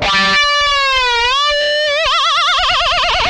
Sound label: whammy fx, guitar